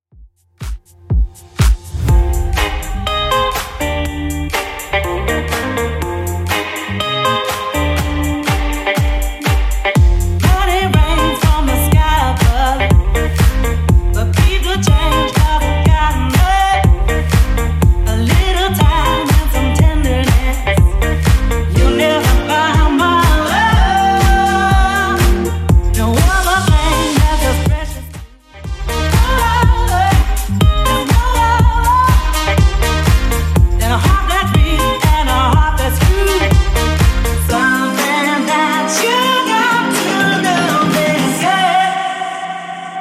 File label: 122bpm